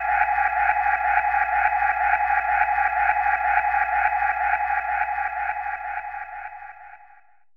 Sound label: EFX